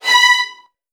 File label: B3, Strings, VN 16TH; ILIO; Kirk Hunter